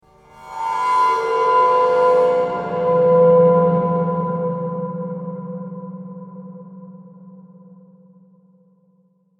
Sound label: Production Element Feedback Ethereal Sweeper; Sound Design